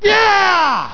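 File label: get power; Pro Android Games; Wolf3D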